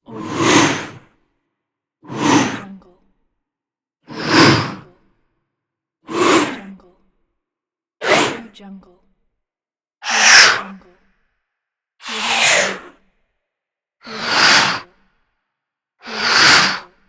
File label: the swooshes